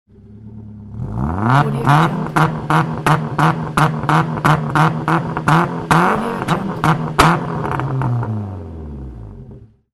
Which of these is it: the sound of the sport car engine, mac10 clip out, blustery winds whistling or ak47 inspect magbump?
the sound of the sport car engine